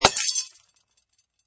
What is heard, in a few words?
Impact Bullet On Glass, Glass falls and breaks